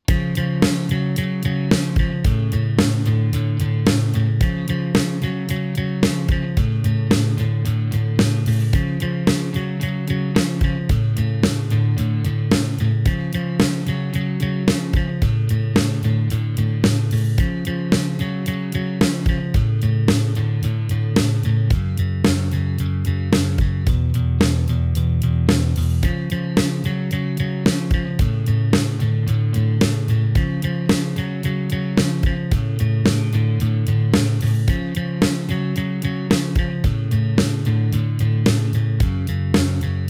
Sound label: PR-30 with song